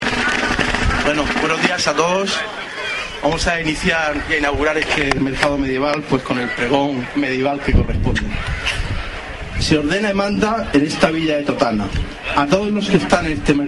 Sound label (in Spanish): mercadillo medieval